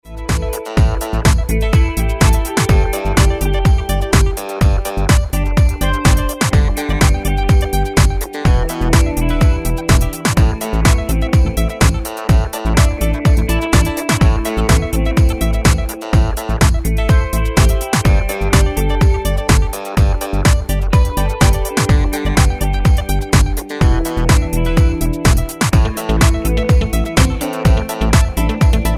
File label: Karaoke